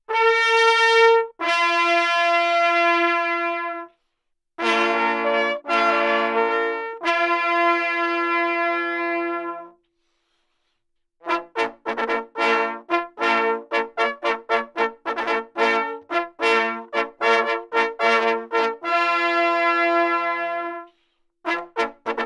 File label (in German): Begruessung Stereo, Allgemeine Signale, Jagd